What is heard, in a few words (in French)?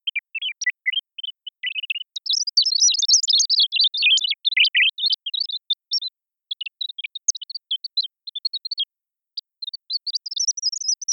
pouillot